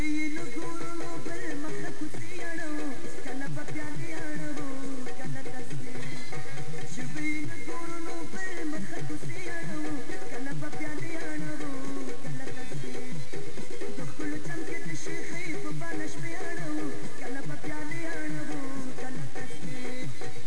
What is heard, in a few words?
baa